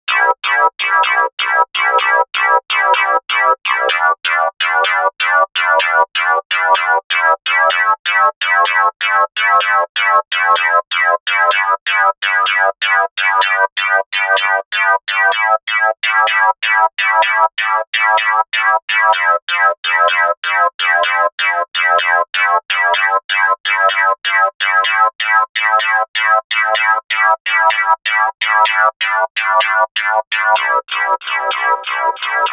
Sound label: Plucked Spectrum